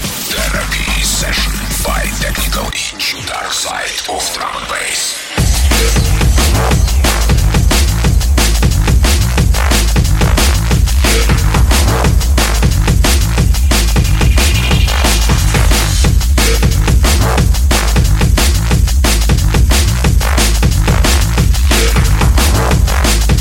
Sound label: drum n bass